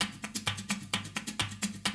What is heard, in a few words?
130, Classic DrumLoops